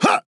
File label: Grunt Jump; Paladins; Buck